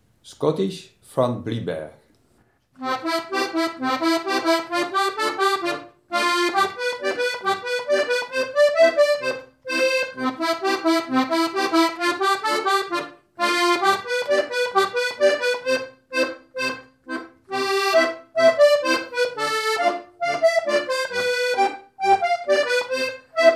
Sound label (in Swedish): schottis fran blyberg